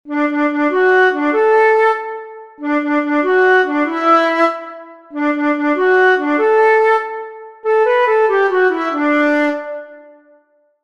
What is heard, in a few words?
Looby Loo, flute solos